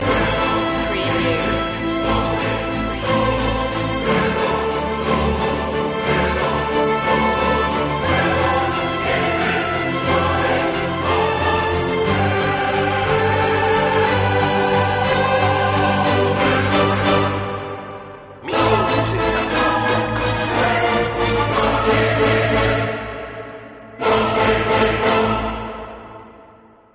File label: no perc